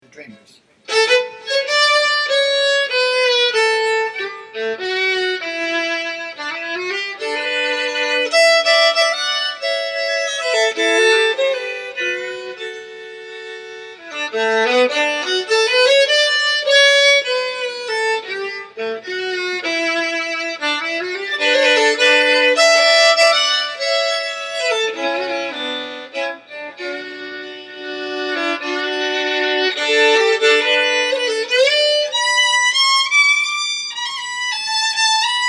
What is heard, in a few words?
Waltz, fiddle; Dreamers